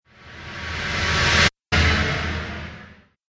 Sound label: Start Stop Start Transition